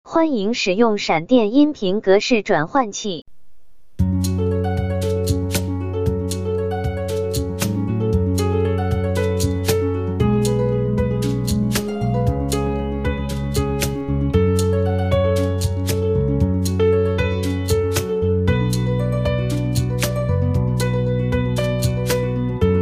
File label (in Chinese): 无和声版